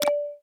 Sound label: ui button click